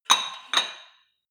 weight rack insert weight multiple

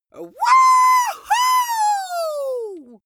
wuhu over excitement female long

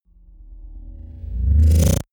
rise drone flutter

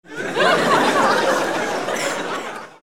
laugh

